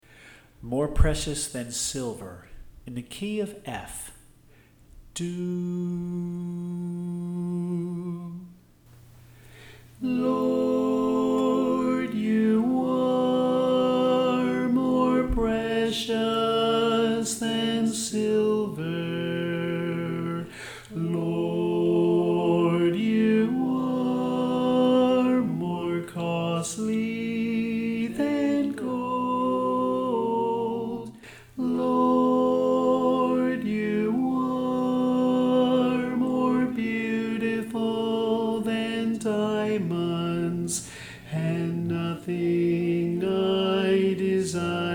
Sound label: Tenor